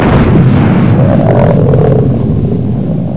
foot; dewars

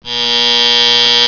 Buzz; Simon and Schuster Interactive

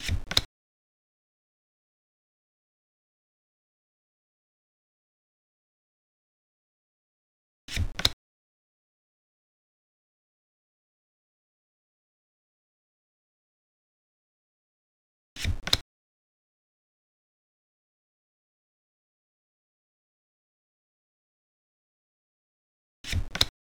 Card Flip

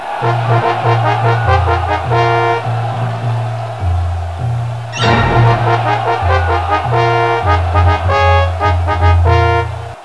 roman fanfare; Hell Cab

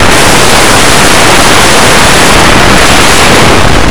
pencil; Blue Heat

